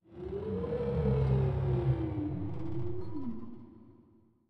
绯红森林菌类声; ambient

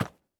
山羊脚步声

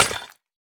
饰纹陶罐碎裂声

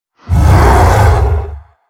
咆哮声; 劫掠兽

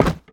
盔甲架击打声

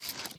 熊猫进食声

